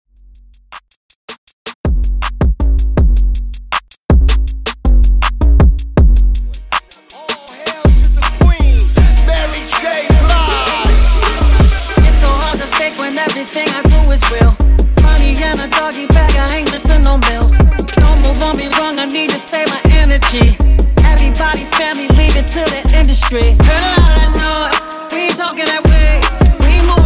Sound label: Hard Bass Edit 80Bpm